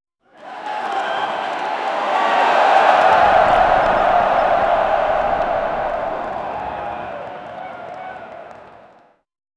create realistic rally an, event

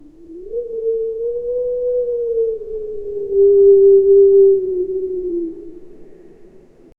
low wind howl distant; atmospheric

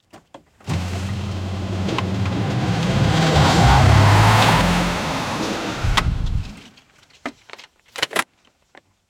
crashing into car

